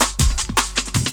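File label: LOOP snare drum, Drum & Bass, 160 BPM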